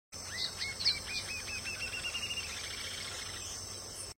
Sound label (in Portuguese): ariramba de cauda ruiva